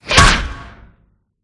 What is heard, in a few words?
Sfx Baseball